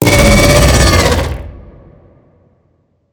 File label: worm roar alt, creatures; Factorio